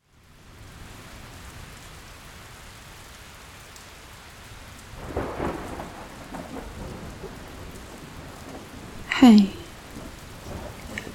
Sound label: cuddles during a thunderstorm